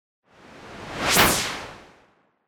Whoosh

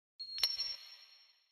UI comform sound

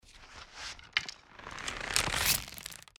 pulling arrow

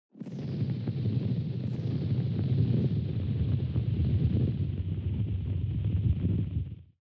heavy wind

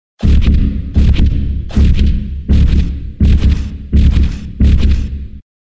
Robotic footsteps

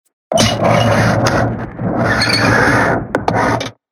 Metal sliding door

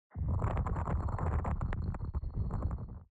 Sliding Stone

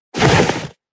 put into stash

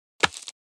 landed on the ground

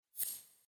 UI comform sound

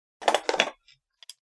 wood material

